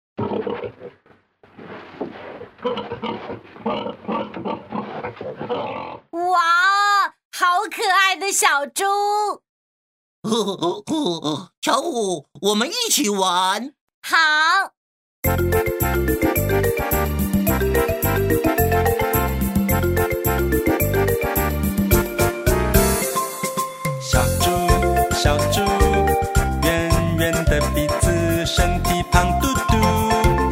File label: music pig; 巧虎音樂圖畫書